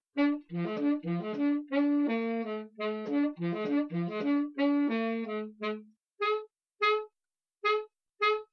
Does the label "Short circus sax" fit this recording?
Yes